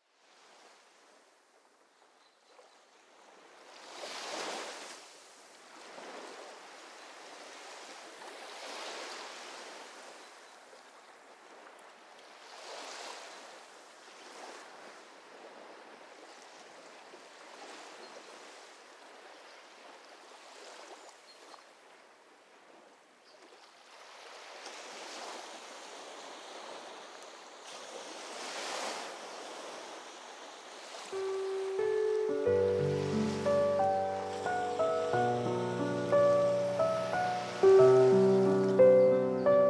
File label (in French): Piano ocean